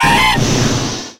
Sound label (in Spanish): Grito de Croconaw